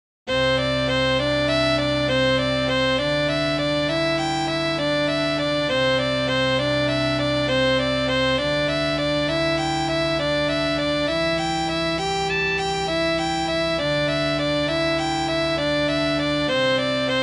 string quintet

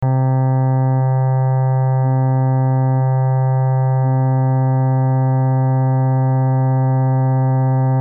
cello

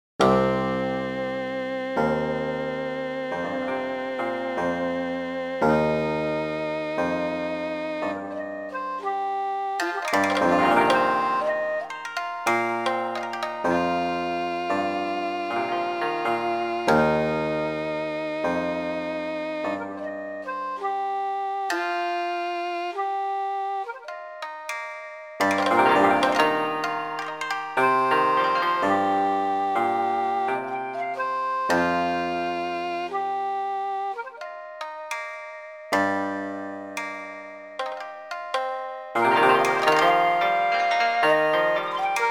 Japanese thirteen limit ensemble, fractal music; hocketting

